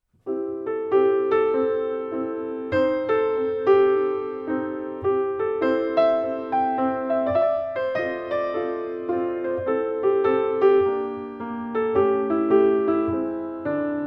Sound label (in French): chanson americaine, piano